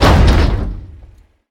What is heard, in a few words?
Veh Hit, Damage; DCS